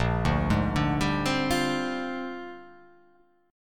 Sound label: Bb 13th; Bb13 arpeggiated